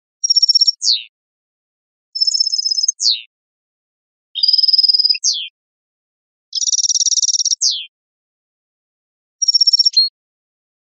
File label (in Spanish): repertorio timbrada, campero